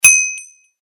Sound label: Bell